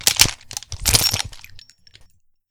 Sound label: 22lr Revolver Ejecting Bullets From Cylinder